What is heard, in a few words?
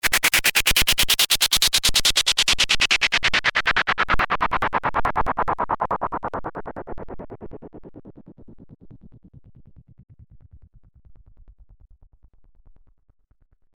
Pink Noise Effix Delayed; Techno